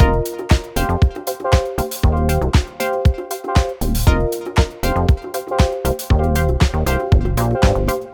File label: mini bass legato; synth bass center; audiolounge soft